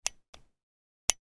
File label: gun 1911; weapon; still north media